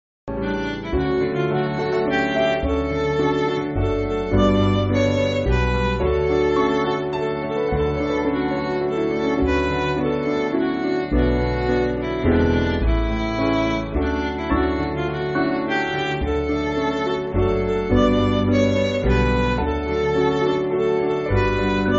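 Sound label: Midi, Backing Files for Churches; Tis The Blessed Hour; Doane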